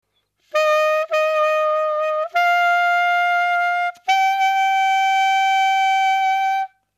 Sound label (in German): AltSax leichtes Blatt hohe Toene